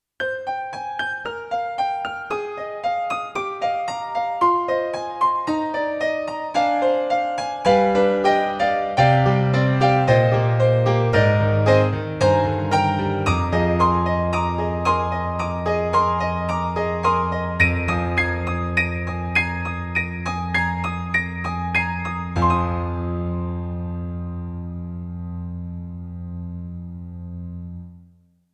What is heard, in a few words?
Alesis NanoPiano Acoustic Piano True Str 8va